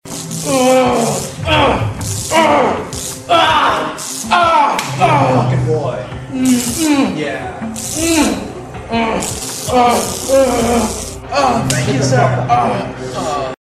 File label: taser; chatsounds